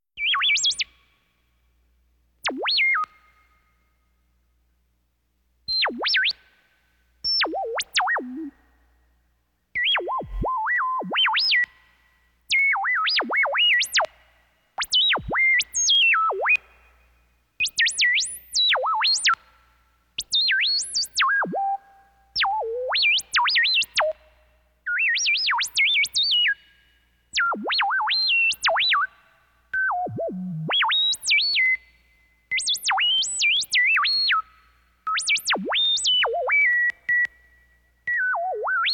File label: Computer, science fiction effects; KMP Film Video Sound Effects